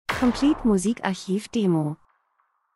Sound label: transition; Transition & Movement - Knall dunkel schnell Delay spannend